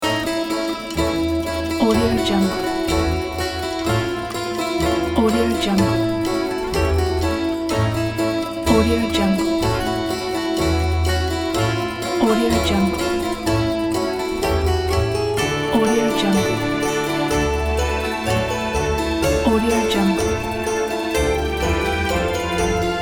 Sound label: steampunk adventure